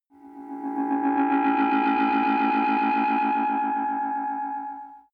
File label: alien radar; energie